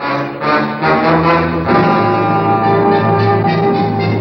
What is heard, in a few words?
Ancient Music FX, Industrial